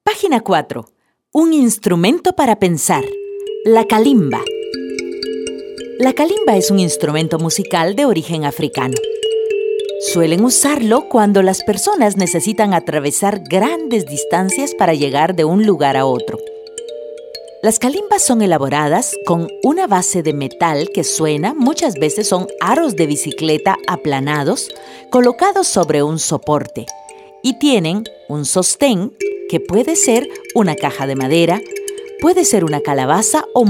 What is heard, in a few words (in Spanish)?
LA KALIMBA; Improvisación en kalimba